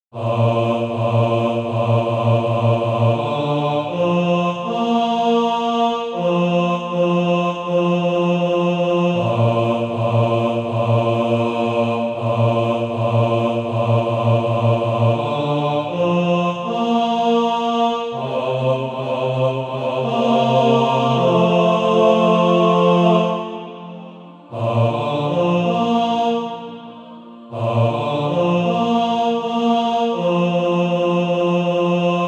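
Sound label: bass, Practise Music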